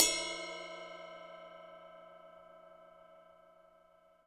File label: HiHat & Crash, Roland TD-20; HiHats